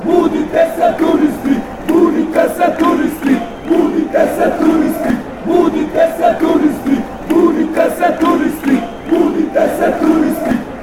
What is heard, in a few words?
bosnia chant